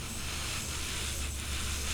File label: fuse; edgar